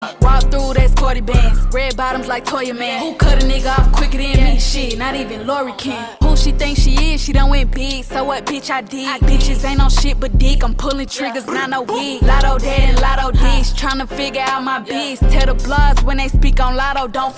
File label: beatbox